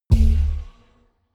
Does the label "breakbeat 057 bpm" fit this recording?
No